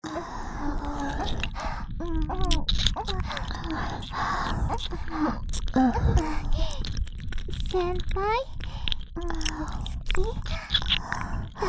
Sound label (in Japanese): しゅきしゅき両耳舐め3WAYループ 耳塞ぎ; 耳塞ぎ舐めver